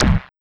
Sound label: Zappy Kick; Sonic Foundry (Sony Creative Software); Esoterik Beatz; Zappy Kit